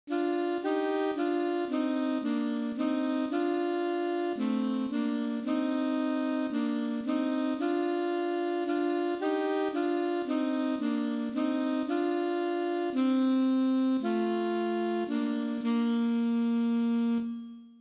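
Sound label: London Bridge, 2 Alto Saxophones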